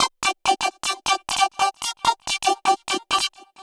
132bpm Lines n Loops Synths, uk garage samples

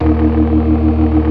organ, all stops; Keith Emerson Signature Series

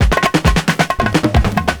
PERCS, 70 s Breakbeats

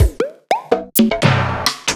Roland Electronic Drum Pad EDP1

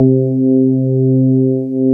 DX PIANO; East West; Twisted Textures-BT

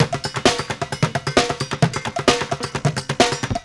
Street Groove, Ambient Grooves

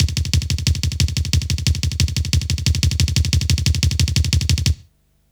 Kick Loop, Drum & Bass; Construction Kit

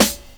SD, snare, 70 s Breakbeats; USB Soundscan vol.46; AKAI

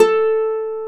Celtic Harp A3; Planet Earth; Digital Sound Factory Planet Earth

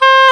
oboe dry; Roland L-CDX-03 Disk 1; Oboe 4-8

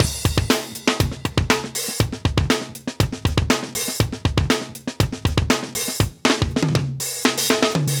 120 live breaks

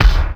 TURBO KICK

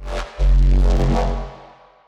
neuro, bass

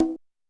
Conga Low, 505; classic machines

